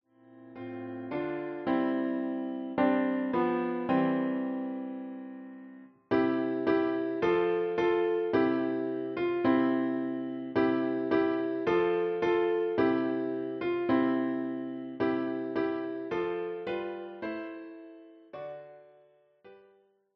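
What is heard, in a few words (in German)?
We Shall Overcome, Klavier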